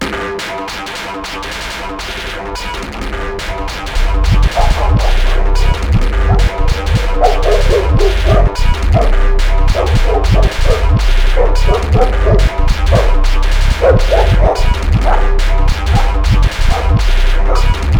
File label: jingle; heat kernel; caniche